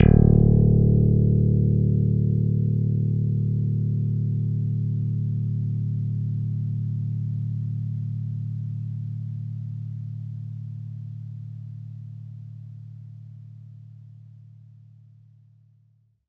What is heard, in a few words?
Bass D, Stick; Giga Samples Collection; BassSide